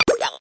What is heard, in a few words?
speak n spell, circuitbent; twip twap; Speak and Spell